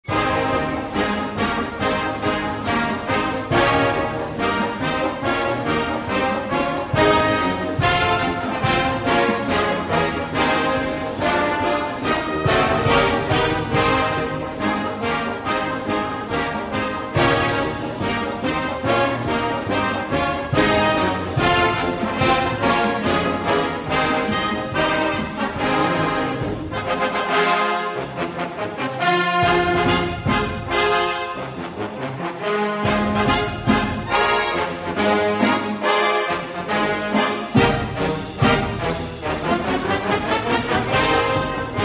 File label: Fight Song Marching Band